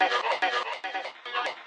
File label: voxuguy, vocal or voxy loops